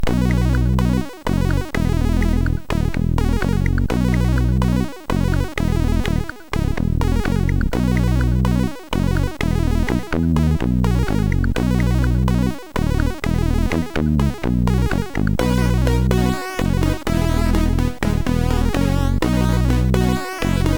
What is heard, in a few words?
Endtune, sid MOS6581R4; hvsc